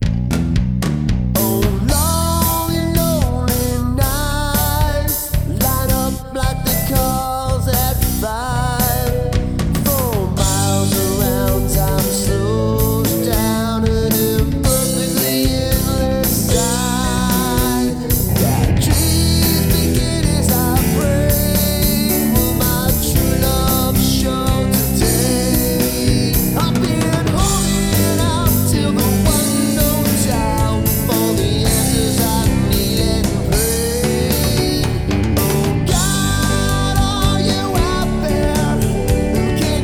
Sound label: male keyboards bass